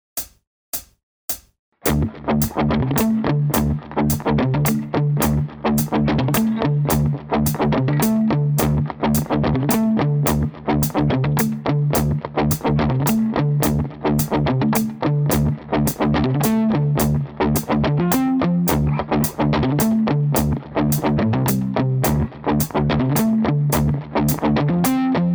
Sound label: riff